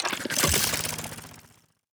heroes goblidrone cast

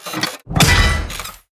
power Slam cast; heroes

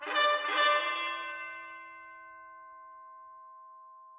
Wave Incoming

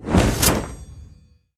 halloween vampiress area attack

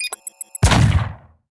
heroes mine Drop explosion

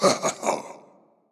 enemy specter interact cast

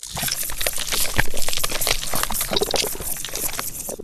web spin towers; mactans

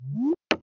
Map New Flag

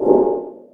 hero alien drone leave

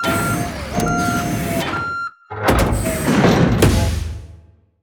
truck one shot; sfx

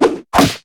hero melee jump

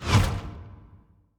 erudite shot

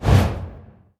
ui rewards disappear

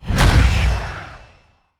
cosmic prison phase in; heroes therien

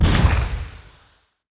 abomination explosion; halloween

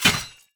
heroes basic Attack; raelyn

